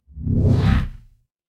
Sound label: transition riser